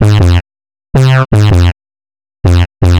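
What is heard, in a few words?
synth bass, drum and bass, 160 bpm, C; eJay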